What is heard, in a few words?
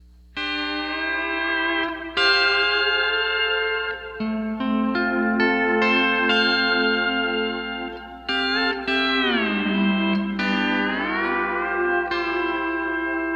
Mod Thru Gate; Hall Of Fame